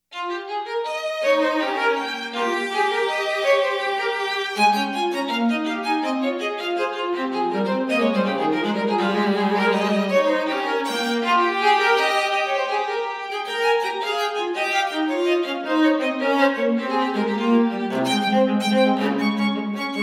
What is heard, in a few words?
String Duo